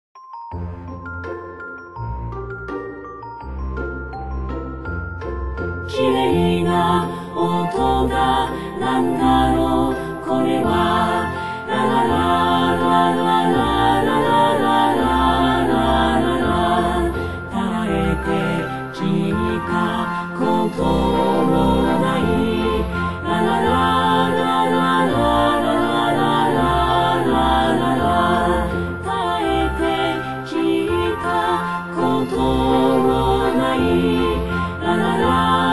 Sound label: chor